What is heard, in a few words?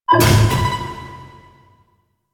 Vaults No Key; Warframe; Entrati